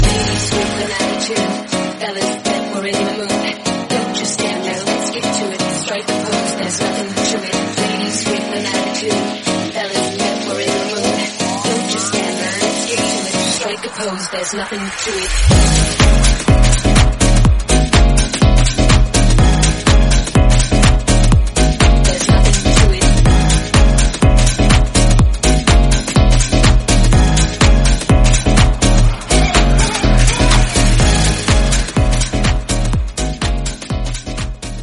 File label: 124bpm Abm